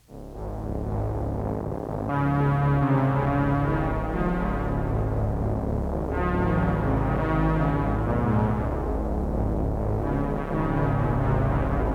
P600 fast strings

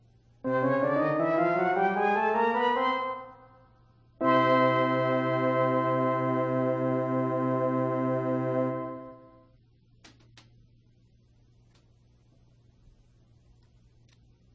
C Scale

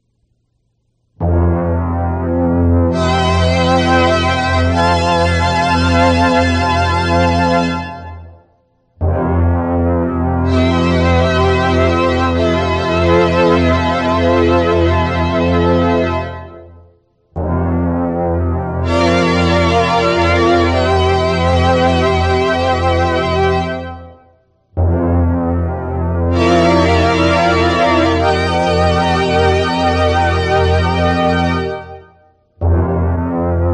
Power String P600, String ens

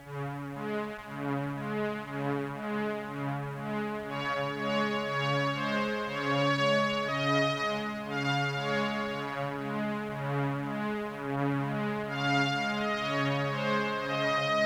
P600 slow strings

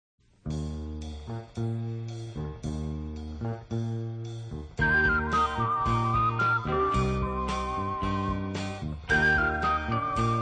Spanish Flute Rock

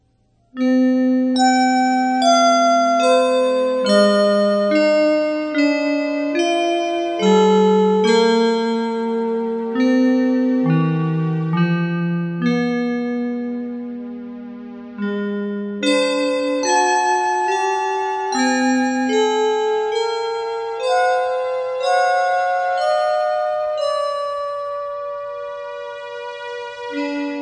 DX7 + MIDI Seq 600 (minor 3rd)

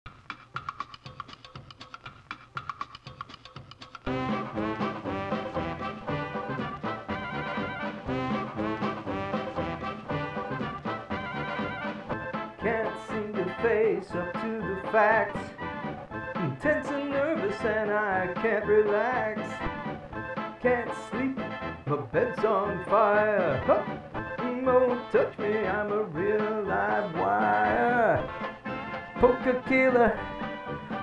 Polka; WFMU

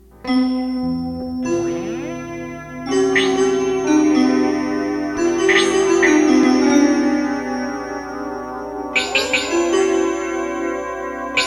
Guitar and synths

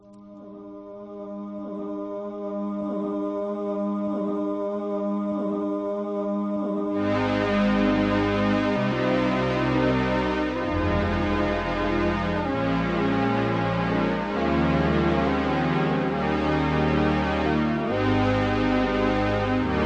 String & Keyed Echo

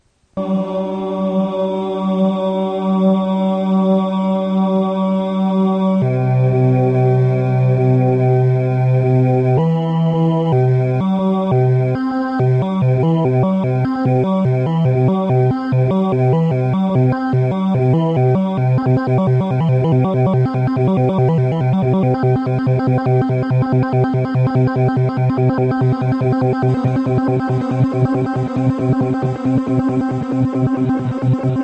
Ahh

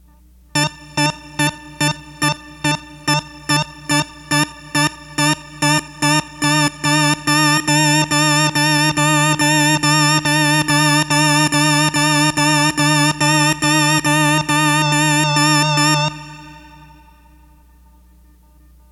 Synths

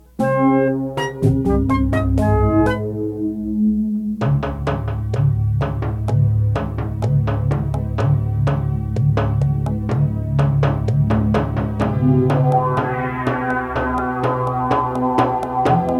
Synthesizer parts

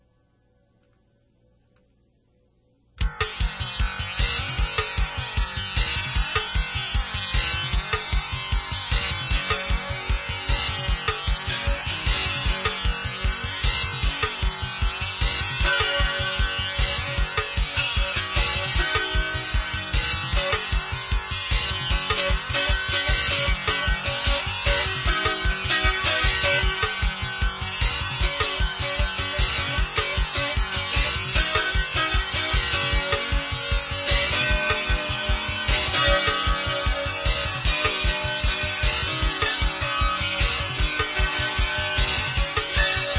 Harp song

